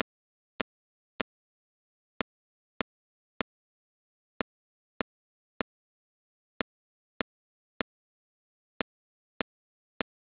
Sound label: Snare vs Ideal impulse